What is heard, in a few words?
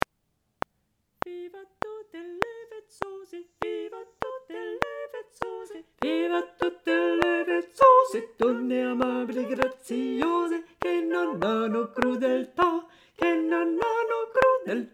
Tenor Basses; Viva Tutte le Vezzose